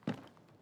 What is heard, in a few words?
Step